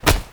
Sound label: Hoverboard Grab; Vehicles; Warframe; Venus Hoverboard